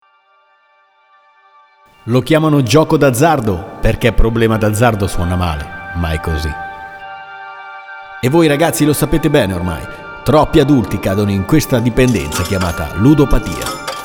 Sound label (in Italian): Promo Beat oscuro